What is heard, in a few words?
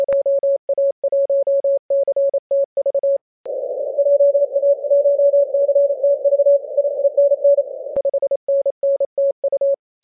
7mhz